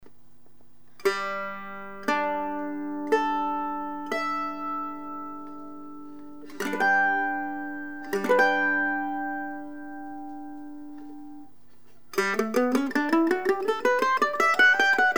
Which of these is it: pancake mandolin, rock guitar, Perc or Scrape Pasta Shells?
pancake mandolin